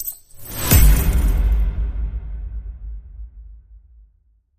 Coin Whoosh Hit

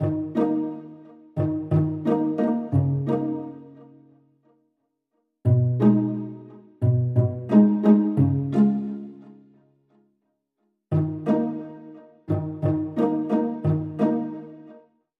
Strings Background

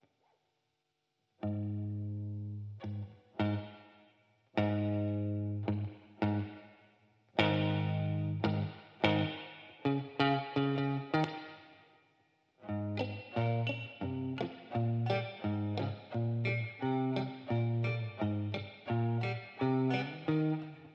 Guitar Clean

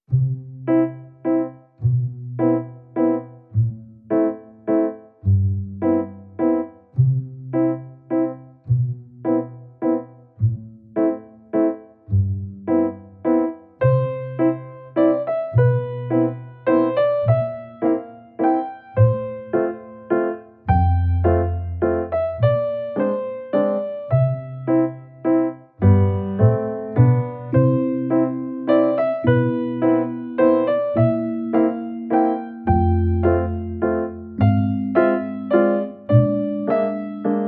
Piano Melody

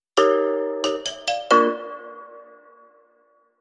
Marimba

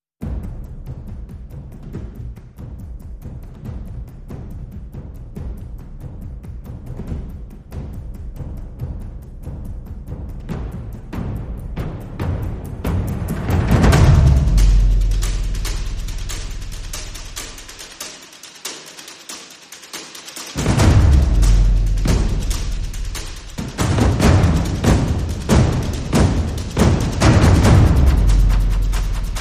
One Hundred Men With Drums, No Pads

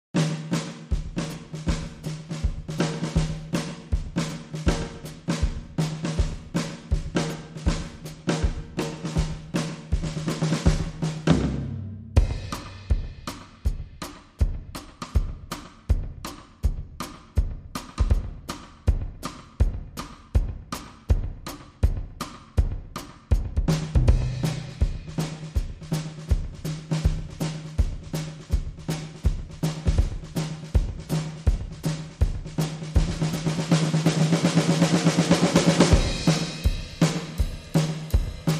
Drums Clean

